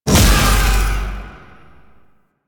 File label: Void Beam Start; Weapons; Warframe; Duviri; Incarnon Adapters